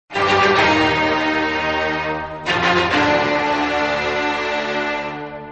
dun dun dun dun

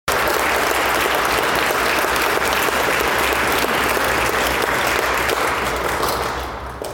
applause

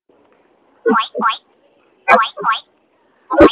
Piggy Oink

